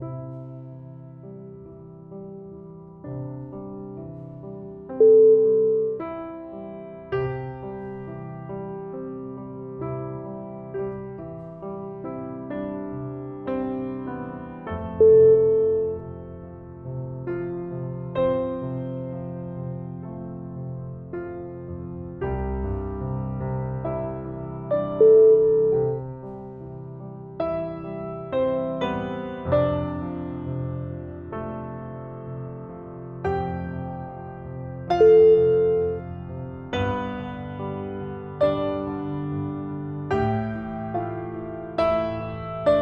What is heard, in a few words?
lullaby ending